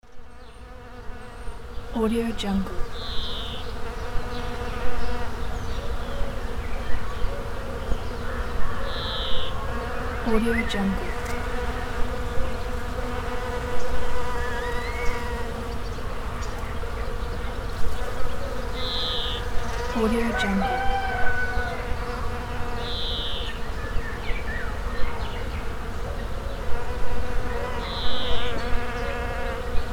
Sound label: bee hives in village; alexm76